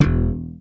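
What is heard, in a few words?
WARWICK THUMP E1, BASS; Masterbits CLIMAX 7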